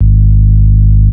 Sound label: sub G#, vocal house; PS-28 Vocal House Samples; basses